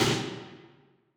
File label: Snare